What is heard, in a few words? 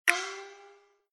BTN INFO; Lucky Wok